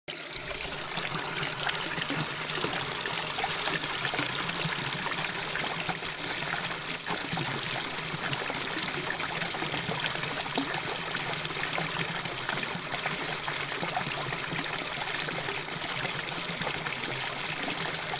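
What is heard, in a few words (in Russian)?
записано на телефон с фонтана в моей деревне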